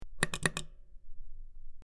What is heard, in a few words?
Button Press In Out Sp, switch clicks